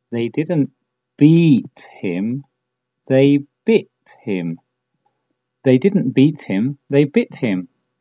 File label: beat